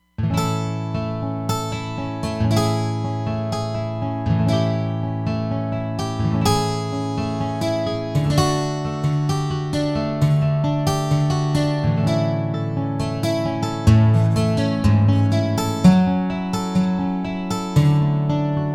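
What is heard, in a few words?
Wide Acoustic Guitar, Roland Fantom XR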